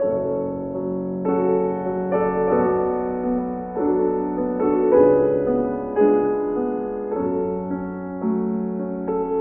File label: piano, Sad